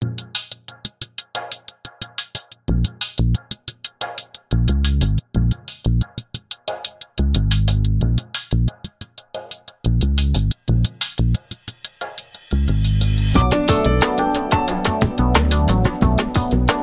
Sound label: Music background pads